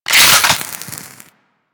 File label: Akimbo Pistol Clip Eject; Grineer